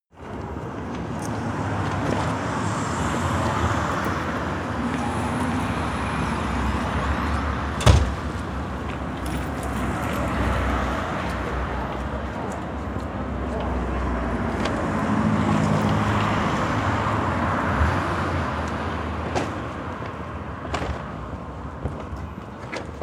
the streets

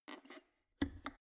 plastic water boiler close lid soft

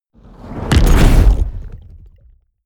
fire whoosh experimental medium

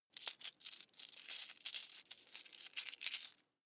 coins clatter medium long

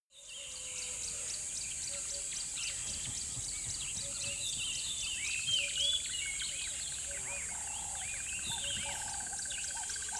river bed dawn chirp soft

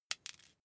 paperclip drop floor

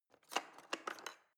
knife wood drawer handle scrape

